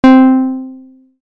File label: Harp